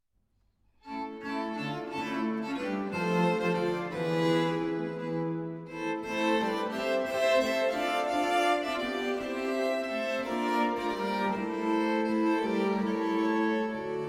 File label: sinfonia a 6; monteverdi madrigals